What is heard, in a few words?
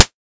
karplus strong